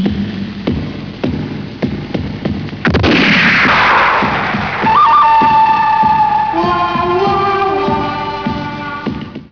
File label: start up short